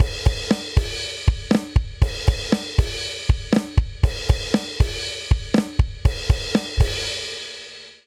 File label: Classic Rock 07 Outro 119 bpm, drum; Ableton; Factory Packs; Drum Booth